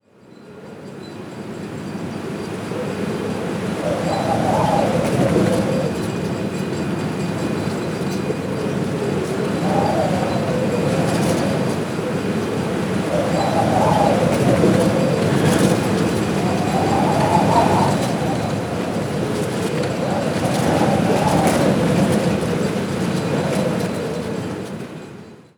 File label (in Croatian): VJETAR